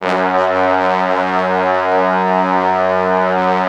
F#1, Orchestral Brass; Best Service ProSamples vol.20 - Orchestral Brass; AKAI

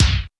U220 FX Set, Drum Machines; Roland U220

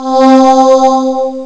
sampl choir; ST-XX Amiga Soundtracker Sample Packs

